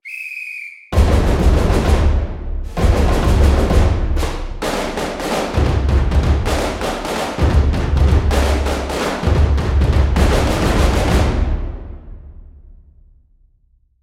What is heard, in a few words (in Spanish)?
musica carnaval; Las Arenas; carnaval 2022